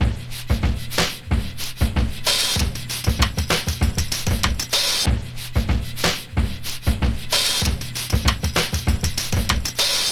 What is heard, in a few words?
95 bpm drum groove b key; Stereo; drum loops